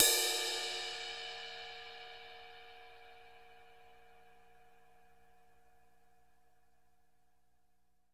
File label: crash tip